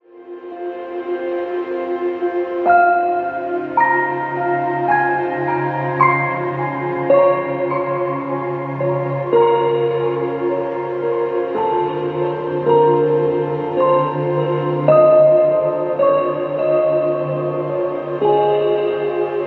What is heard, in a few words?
ringtone; the girl from plainville